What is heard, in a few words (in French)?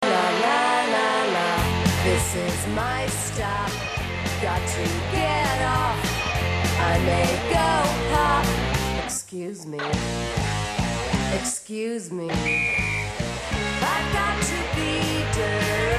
générique